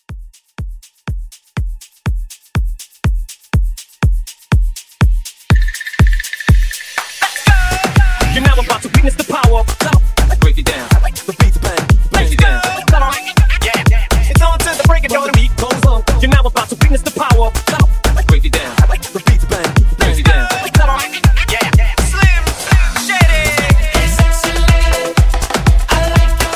Club 122 CLEAN

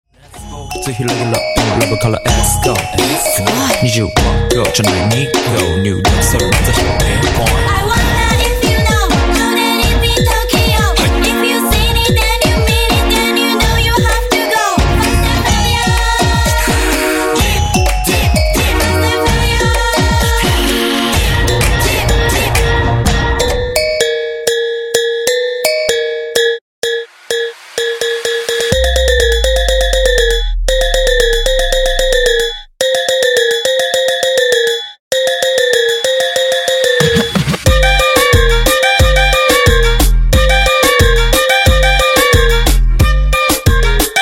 Transition

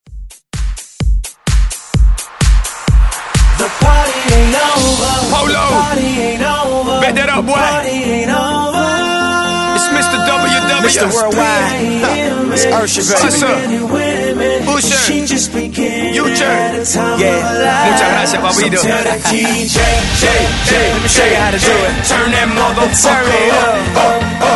ReDrum 128Bpm; DJDOLLS

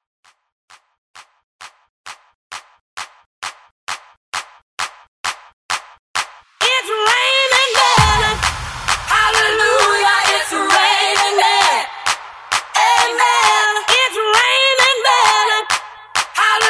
Clap A Pella Starter

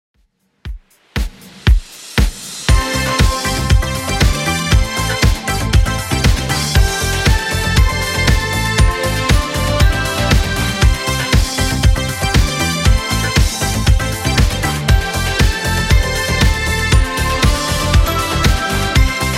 80s Redrum 118bpm

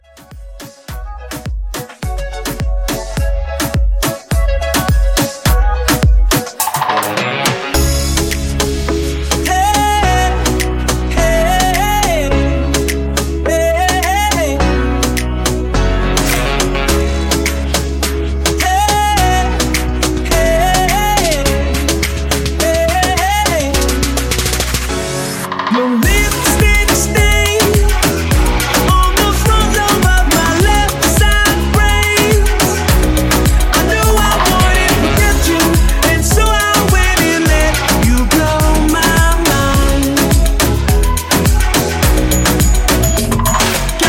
Moomba Mashup 105

